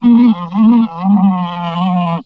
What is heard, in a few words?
die